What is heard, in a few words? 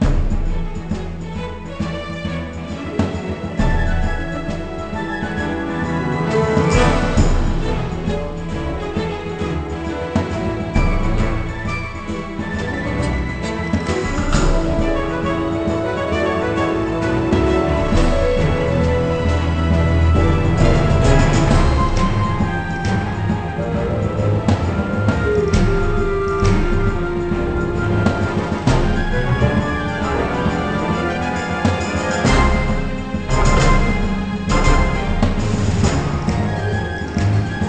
Battle Village; How to Train Your Dragon; nds